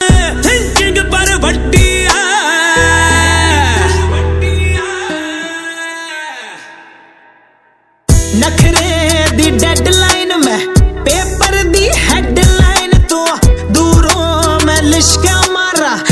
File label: punjabi ringtone